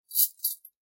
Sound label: Chain Foley; SoundsCrate